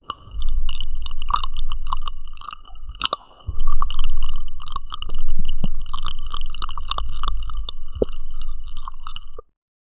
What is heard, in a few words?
short sharp underwater; aquatic